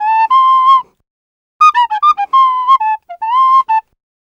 Penny 103bpm F, Penny Whistle

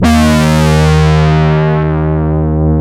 MATRIX 12, OB Synths, Analog

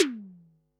snaredrum, Pearl SC-40

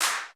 CLAPS, SY99; Crypton - DrumBase5000

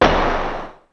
Snare, Ensoniq ASR-X Pro

